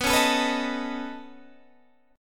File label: Db 7th Suspended 2nd Sharp 5th